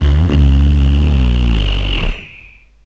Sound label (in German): Pups